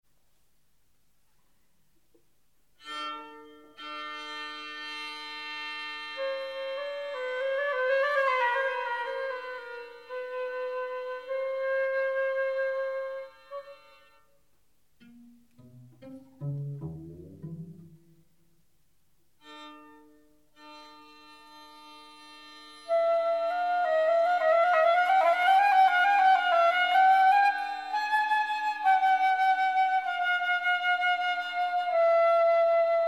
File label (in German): Trio fuer Floete, Viola und Violoncello; Anfang